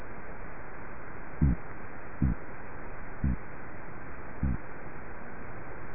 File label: grunt amplified atlantic cod